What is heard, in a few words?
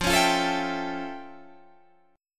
F Augmented Major 9th, Guitar, Open D